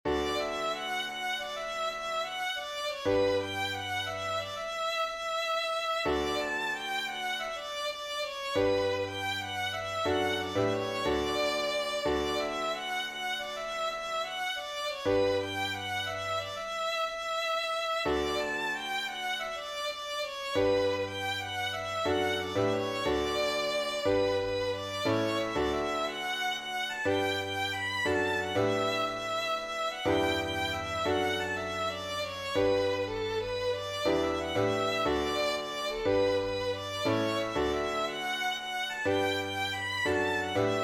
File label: the kerry polska